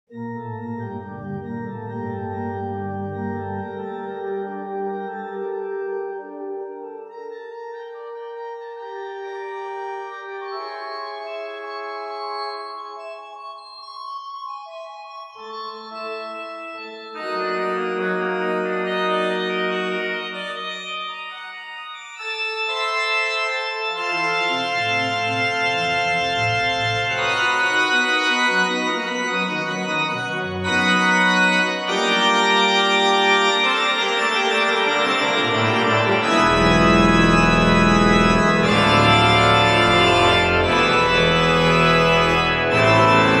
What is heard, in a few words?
Concert Organ